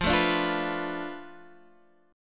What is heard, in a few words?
F 6th Flat 5th F down Guitar DADGAD, chord